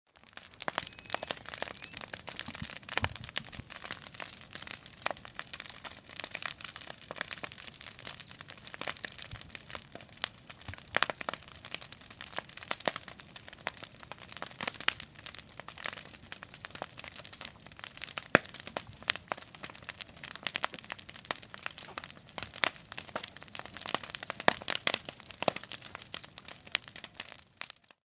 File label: Fireplace